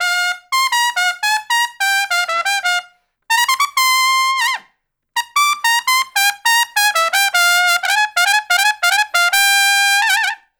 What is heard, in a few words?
Trumpet Solo, Jazz Traditional